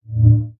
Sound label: Fire Extinguisher Hi; Daredevil